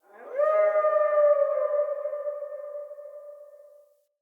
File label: Wolf Cub Landscape Howl; Orengal